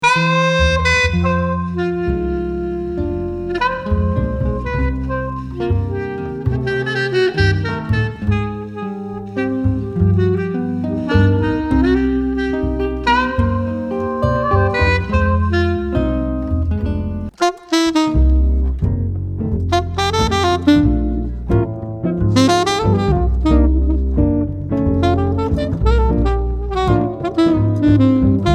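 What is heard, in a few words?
Medley noel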